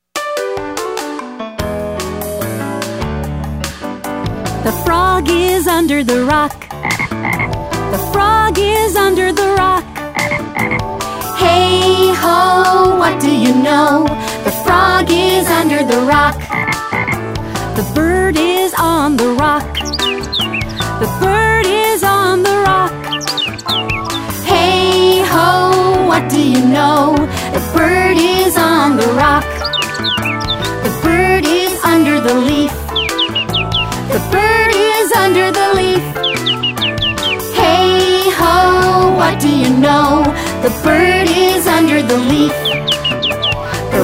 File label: Frog Rock